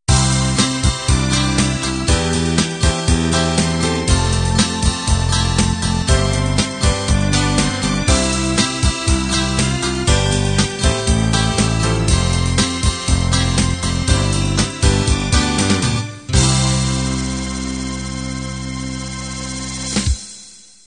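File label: pop 16 beat end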